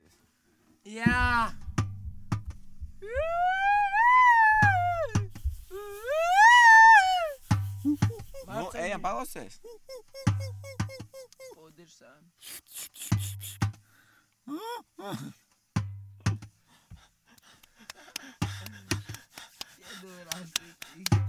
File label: eeeehhhhh